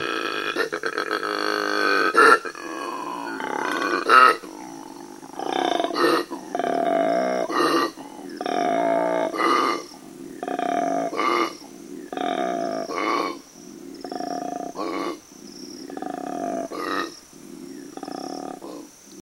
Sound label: koala magnetic island